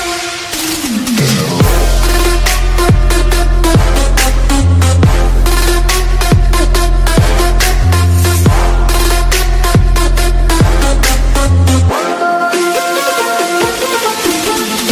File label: Arabic Beat